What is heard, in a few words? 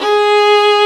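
Vio legato G#3; Digital Sound Factory Virtuoso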